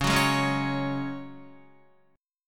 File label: Db Suspended 4th Db, Guitar; strummed